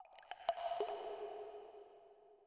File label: water drip; Rust